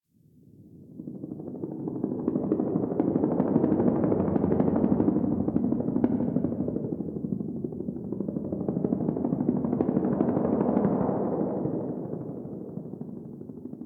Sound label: Distant Ambient Helicopter; Warframe; Ambience; Nineteen Ninety Nine